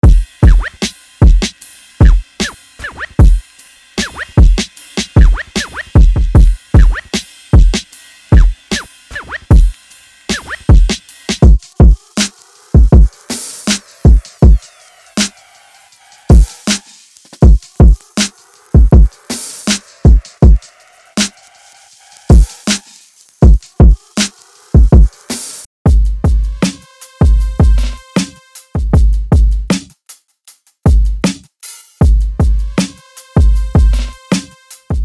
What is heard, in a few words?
Drum Breaks; vintage soul and rnb; ric beats